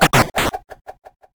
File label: Eye Spin Button